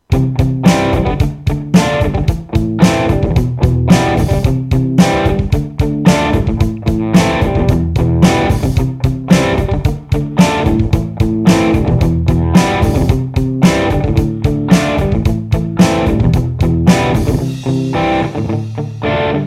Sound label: indie rock